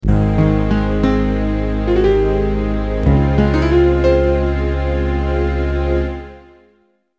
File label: Fanfare Rival; Ranger